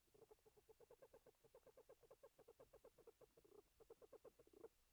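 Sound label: bufo underwater calls; soniferous; Gilligans Island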